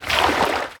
Swim; moul-assets

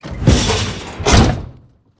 Engineer Door Op

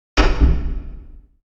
Glow Room Button Up; moul-assets

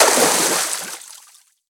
Water Jump

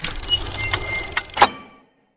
Ring Puzzle

